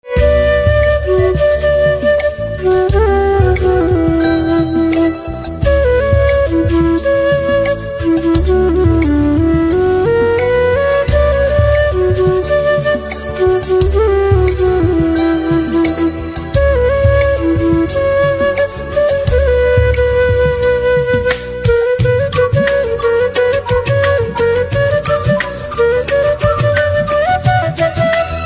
Flute Instrumental Ringtone

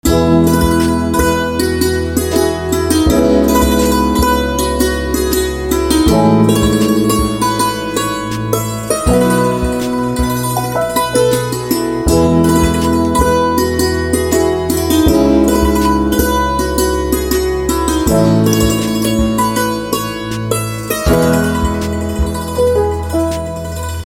Santoor Instrumental Ringtone

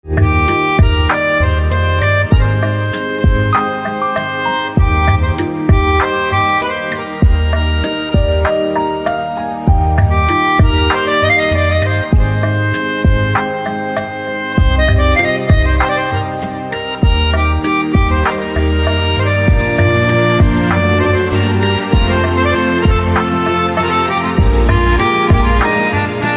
Pianica Instrumental Ringtone

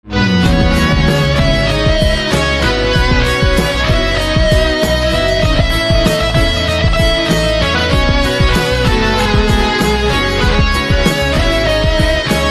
Electric Guitar Instrumental Ringtone

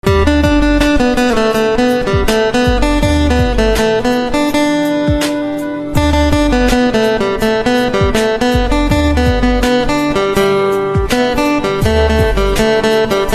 Guitar Ringtone

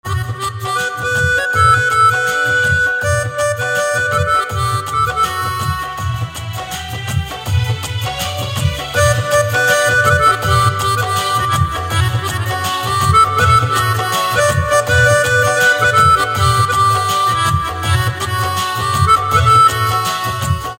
Pianica Instrumental Ringtone, punjabi

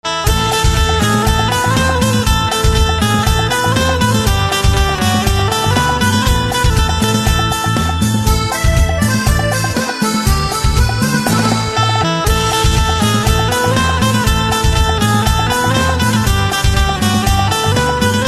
Banjo Instrumental Ringtone

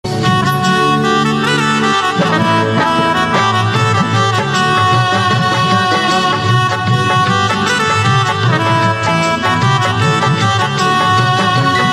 Saxophone Instrumental Ringtone

